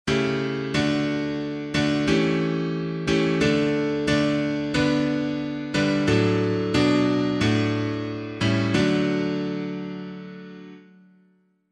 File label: piano